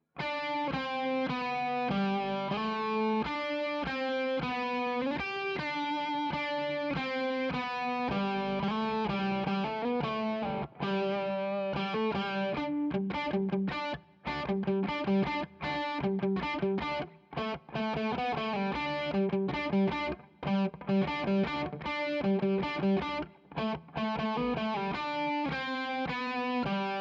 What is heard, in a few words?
Guitar Bday